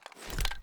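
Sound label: reload extended magazine end; gmod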